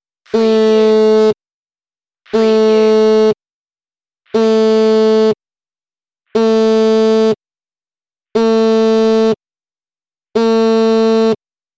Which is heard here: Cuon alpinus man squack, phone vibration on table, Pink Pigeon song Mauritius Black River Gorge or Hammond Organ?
phone vibration on table